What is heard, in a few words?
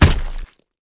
Monster Hit; Game rip; MiSide